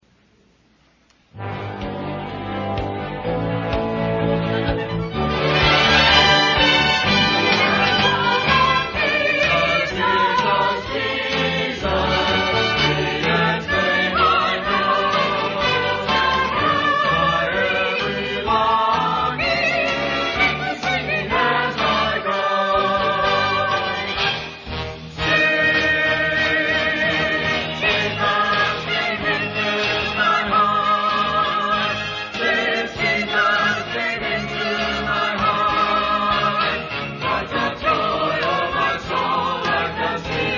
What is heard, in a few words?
choir